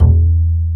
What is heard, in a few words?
BS UPRIT H, Jazz Bass Acoustic Bs; Roland LCDP02 Guitar and Bass